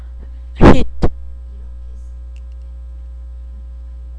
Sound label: hit